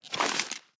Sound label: page flip